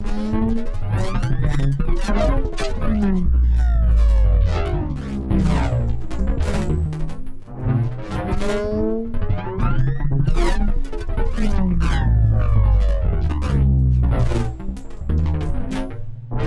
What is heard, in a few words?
kick explorations